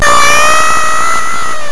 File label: 8bit agony